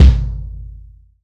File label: ROCK KIK, ROCK KICK; ROCK CKIK1